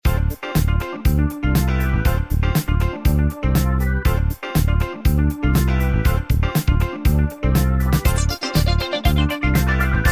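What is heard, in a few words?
cocktail party upbeat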